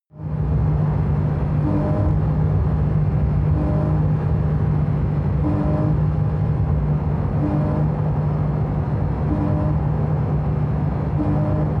sports car int engine drive short thrusts increasing rpm high; verkehr transport; autos